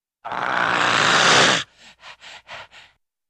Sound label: Funny Dog Growl Pant